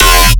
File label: Ghastly E, BASSES; knights